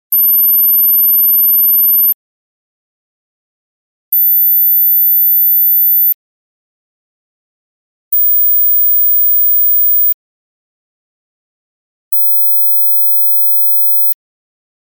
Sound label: tones left